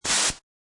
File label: Air Hose Spray Short; Air Pump; Lynne Music